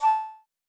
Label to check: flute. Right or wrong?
Right